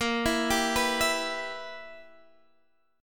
B Major 7th Suspended 4th Sharp 5th, chord

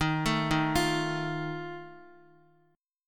D sharp Suspended 2nd Flat 5th D sharp Guitar; arpeggiated; JGuitar

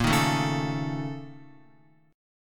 Bb Minor Major 7th Double Flat 5th, Guitar; BbmM7bb5; strummed; x,1,1,2,1,4; JGuitar

